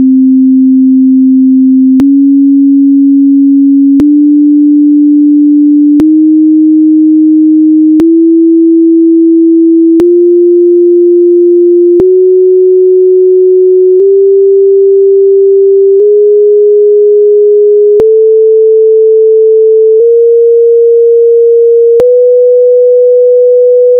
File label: sound semitones